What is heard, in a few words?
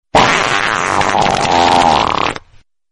Loud Wet Butt Trumpet Ringtone